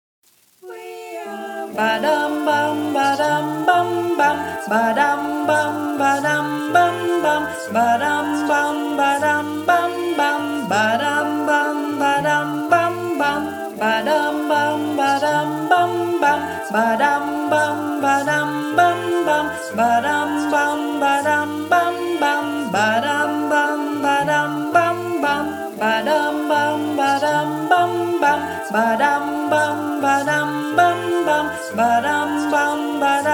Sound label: tenor